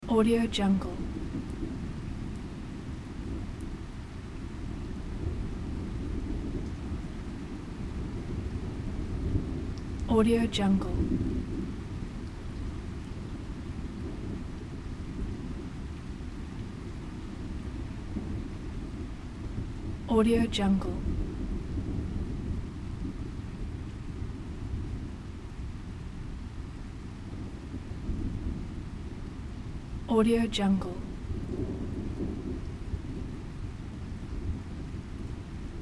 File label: rumbling storm with intensive rain; nature sounds; غرش طوفان با بارش شدید باران